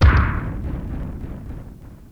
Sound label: Sledge Bros Ground Pound